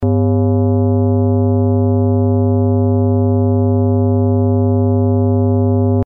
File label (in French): Accord inharmonique 10 partiels Fp=100Hz & Fm=150Hz